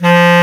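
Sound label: Bass Clar mf F1; Digital Sound Factory Virtuoso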